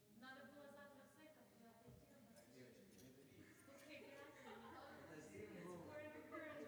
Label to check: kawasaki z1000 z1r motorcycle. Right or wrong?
Wrong